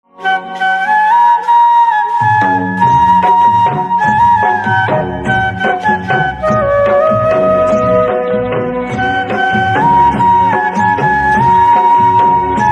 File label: Flute Instrumental Ringtone